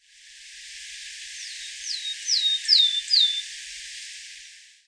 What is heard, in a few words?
Parus montanus